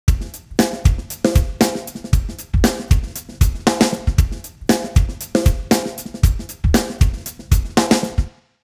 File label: drums 90; usb uvi workstation; timestretch